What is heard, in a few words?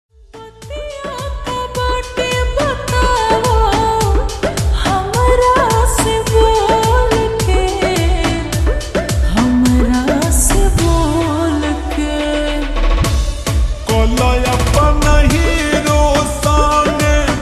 Slowed Reverb Ringtone